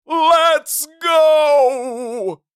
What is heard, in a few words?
Lets goo male cheering; cartoon voice; gfxsounds